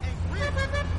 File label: horn; gta 5